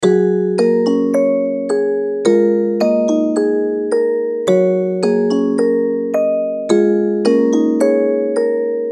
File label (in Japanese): ミステリー オルゴール